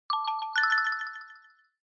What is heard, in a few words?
Smartphone UI Sound Speaker